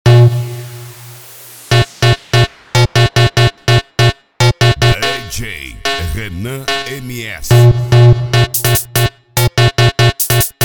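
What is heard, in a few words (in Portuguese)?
alto falantes loudspeakers 5250 w agressive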